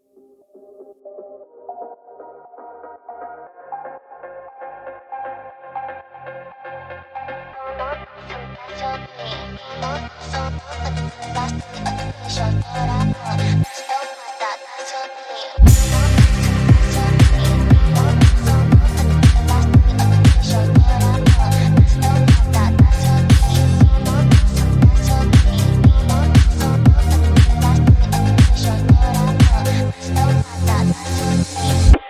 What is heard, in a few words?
Bass Boosted